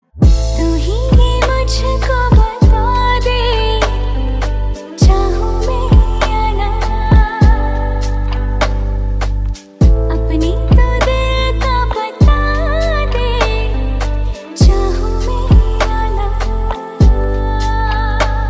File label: love hindi song tone ringtone; bollywood